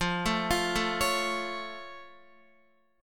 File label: F Augmented F down Guitar; arpeggiated; JGuitar